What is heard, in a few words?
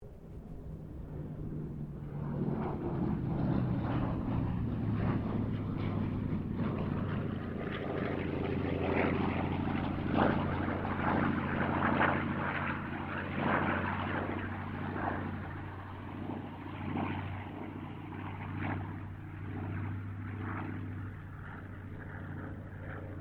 AIRCRAFT TROJAN SQUADRON 4 PASS DISTANT